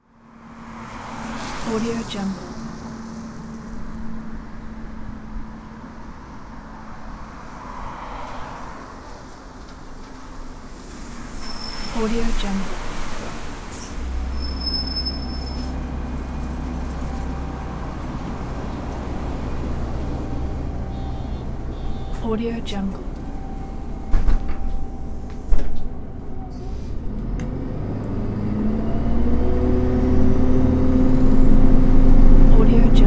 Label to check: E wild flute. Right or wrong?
Wrong